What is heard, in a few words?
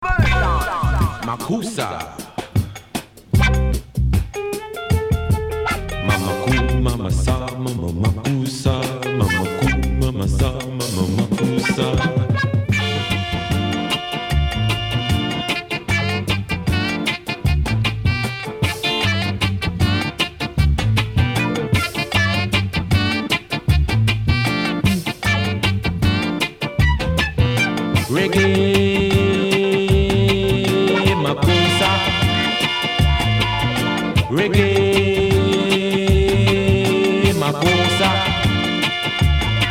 reggae makossa